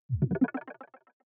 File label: button echo marble bubble; soundpool